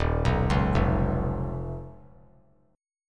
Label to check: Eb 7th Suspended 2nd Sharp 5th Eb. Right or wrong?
Right